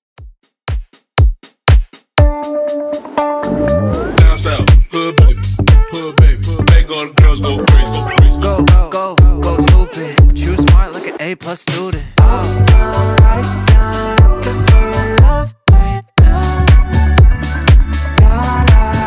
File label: Top40 Redrum 120bpm